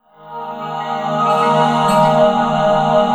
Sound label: PAD, Drum & Bass, 150 BPM; Ueberschall; Drum & Bass Resonance